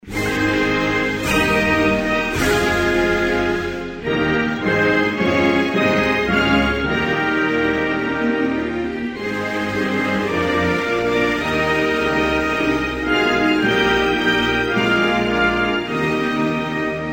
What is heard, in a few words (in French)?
Canada, Hymnes nationaux